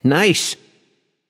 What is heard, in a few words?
happy; smite; voicepack; osiris